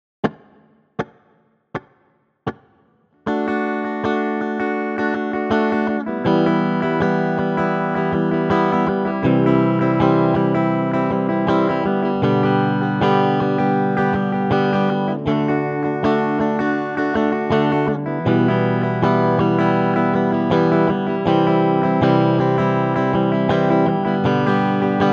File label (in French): Rythmique; Les doubles croches; Les rythmiques II